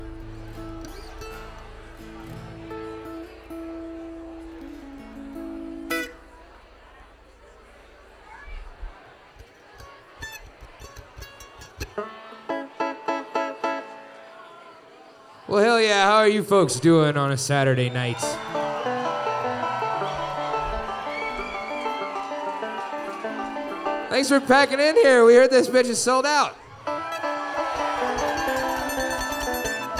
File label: Crowd Banter